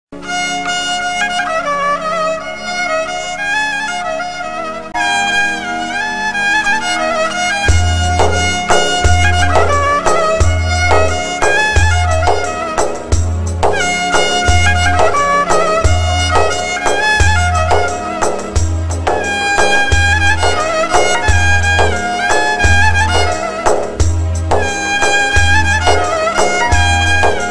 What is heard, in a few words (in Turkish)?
keman zil sesi